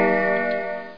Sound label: bell